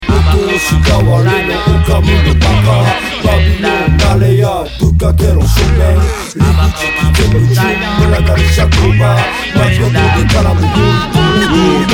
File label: dub